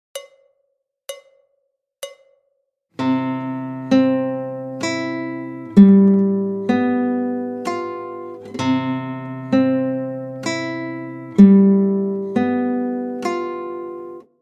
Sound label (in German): Griffwechsel zwischen C und G7, gitarre